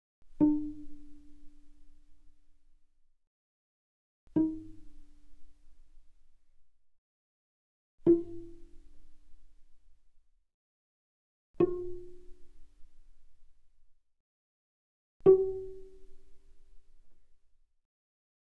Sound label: Cello pizz mf sul D D4 D5; mono; Strings